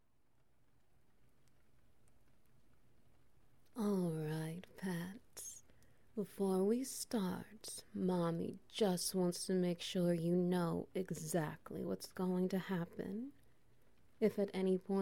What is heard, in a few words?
Ambience SFX Wet Noises